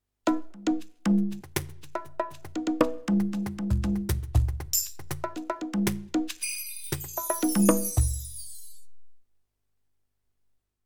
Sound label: Roland TD07 Percussion